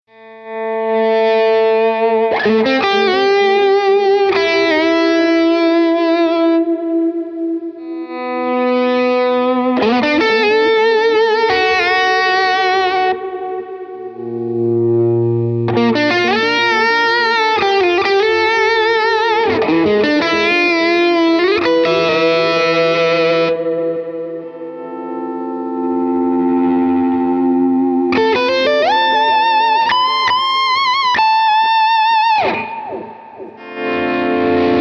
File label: PRS NF3 Keeley Compressor Imagine Overdrive DD1 Catalinbread Montavillian Vox AC30